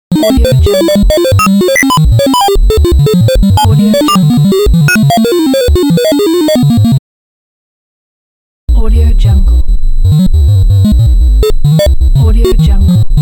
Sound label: 8-bit computer; throneaudio